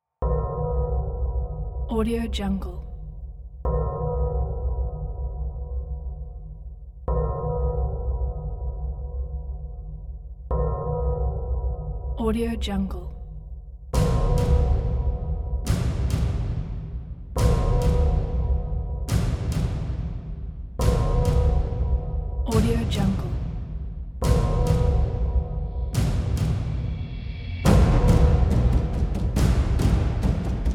modern action trailer percussion

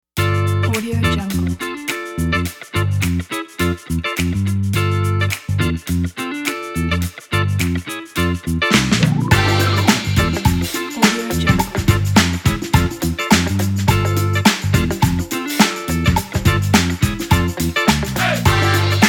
funky

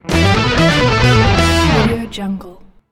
heavy rock logo; af-sounds

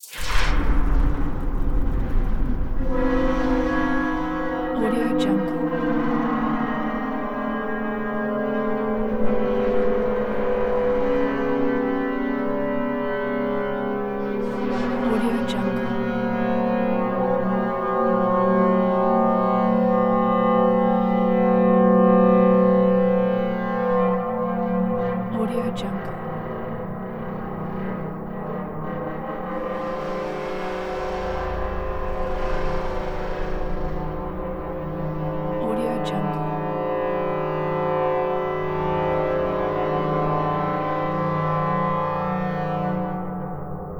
dissonant shock drone; lokohighman

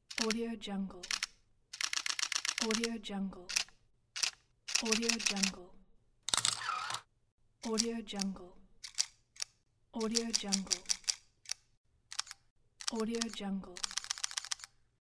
camera shutter collection

